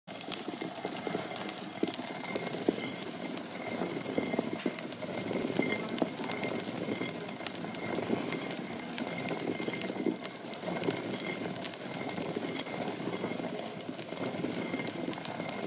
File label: stone mill; آسیاب سنگی